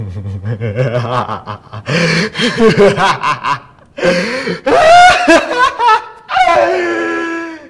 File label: evil laugh; xinalized; vortex